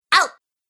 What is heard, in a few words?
Cartoon Girl Ow; Cartoon Voice Sound Effects